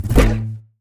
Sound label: atk cello